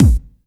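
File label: EFEX bass drum, FX DRUM; AKAI S6000 CD-ROM - Volume 1; DRUM RYTHM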